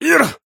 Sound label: pain big